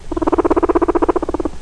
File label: annoyed, cavia